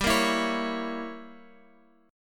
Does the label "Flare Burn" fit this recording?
No